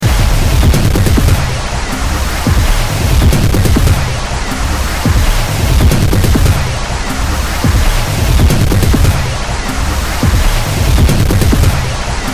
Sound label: loop